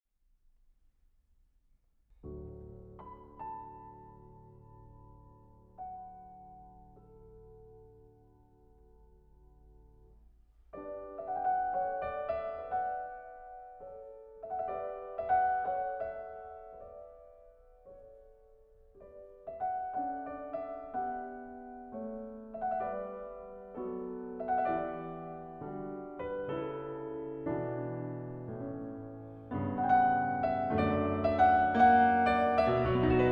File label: Piano; 19 Norwegian Folk Songs, Op. 66; Grieg